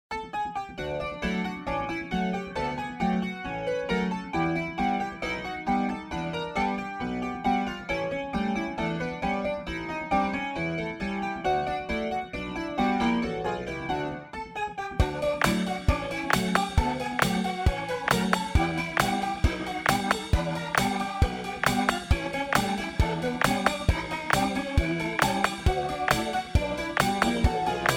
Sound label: Rotary Fun